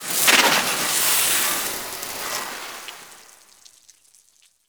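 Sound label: water pipe burst decay; Explosions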